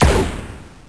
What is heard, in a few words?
TURRET; FRAGILE